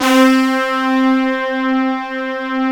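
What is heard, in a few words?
trumpet section C3; Synth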